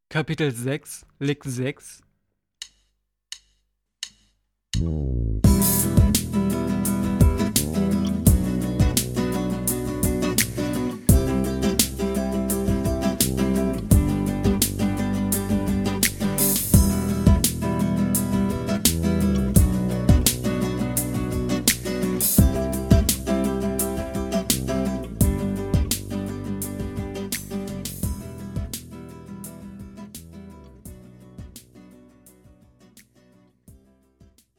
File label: Latin Style Guitar